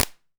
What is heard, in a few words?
EH16 CLAP, Electro-Harmonix DRM-16